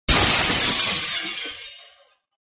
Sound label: grass break